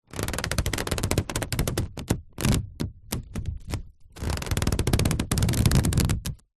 household plastic food wrap cling film stretched rubs squeaks